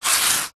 industrial sand paper single rub against sand paper